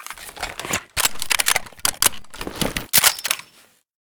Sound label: sa58 reload empty